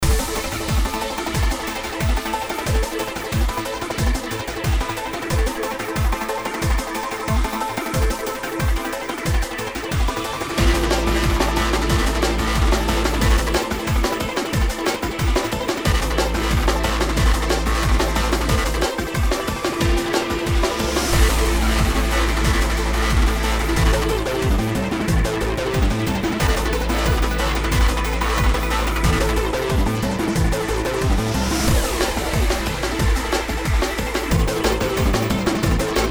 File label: Techno; Vector; Techno Park